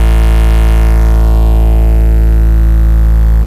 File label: Bass Root A